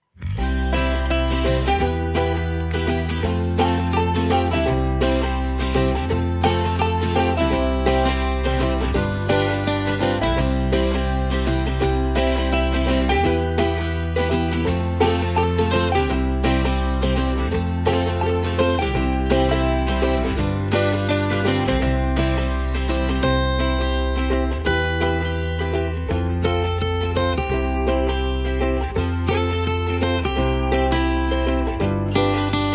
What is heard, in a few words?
Guitars Ukulele and Bass Only